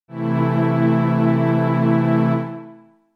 E A Minor

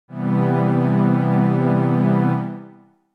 C Major7

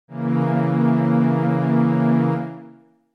Bb Diminished

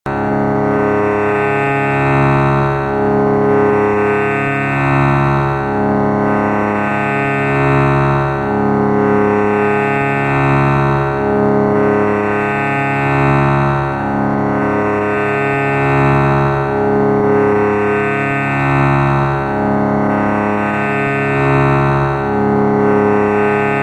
Sruthi C Sharp

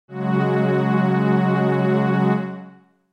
F# F# Suspended2

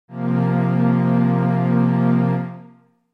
F Suspended4

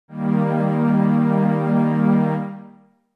Eb Major

